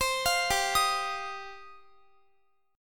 G 7th Suspended 4th Sharp 5th G down Guitar; Listen to G7sus4#5 arpeggiated; G7sus4#5 Chord

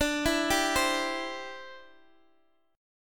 D 7th Suspended 2nd Suspended 4th, Guitar DADGAD; arpeggiated; x,x,12,9,10,10; chord

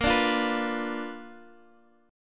B Minor Major 7th Flat 5th, chord

